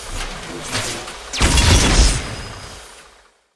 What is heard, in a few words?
robotkid atksp, monster